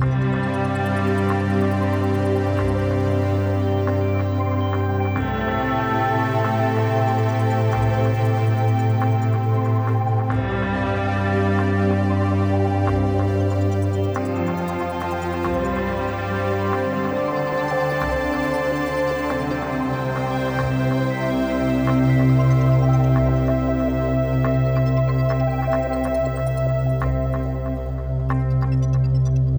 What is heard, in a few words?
Player Neutral